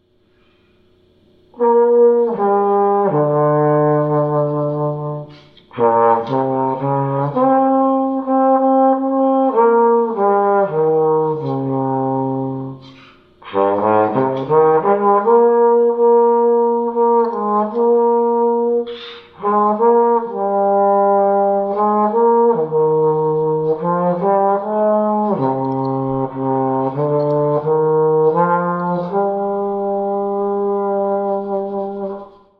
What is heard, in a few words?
Misty Reverb